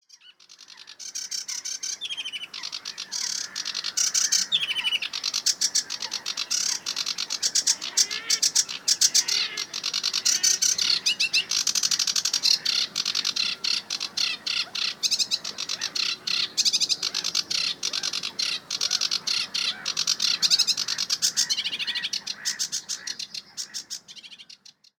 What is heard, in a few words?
Sedge Warbler